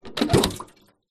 industrial small metal container with liquid inside open; zapsplat